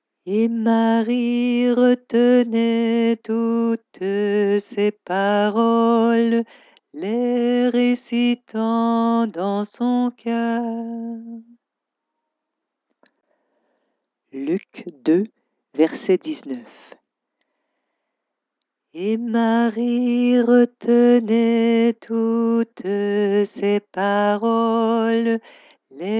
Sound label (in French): recitatif; Lc 2,19 Et Marie